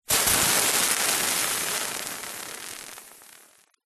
horror acid burn; zapsplat

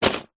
office pencil case drop

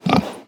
pig grunt breath single; felix blume

